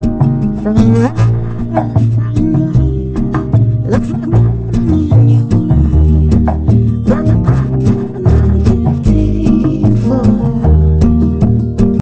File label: music